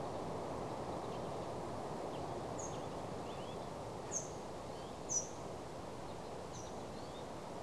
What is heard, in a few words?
Pallass Rosefinch calls, nocturnal flight call; Lingshan; Beijing; nocturnal flight calls (NFCs)